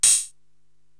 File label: HH KPR 55 Open, Korg TR-Rack drum machine